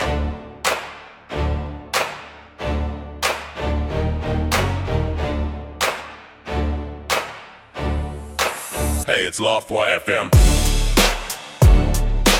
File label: HipHop Segment; haha fm